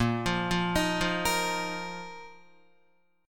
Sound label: Bb Suspended 4th, Bb, down, Guitar; Bbsus4 arpeggiated